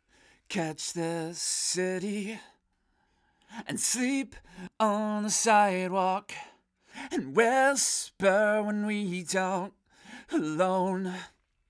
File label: raw vox solo